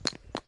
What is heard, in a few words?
Rock Crumble